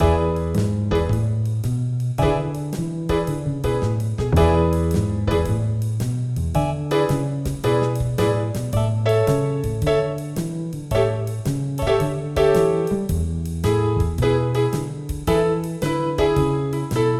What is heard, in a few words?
Blues in E; Opcode EZVision Demo Song